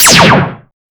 laser

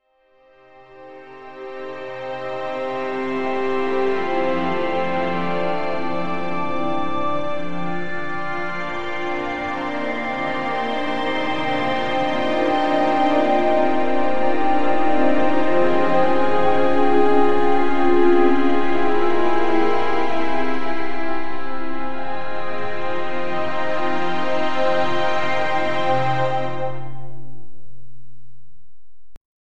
classical ambient

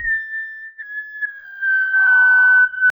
soft distant military bug; 1940s

